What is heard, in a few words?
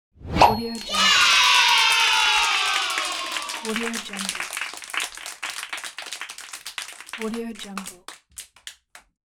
whoosh pop with children yay cheer; applehillstudios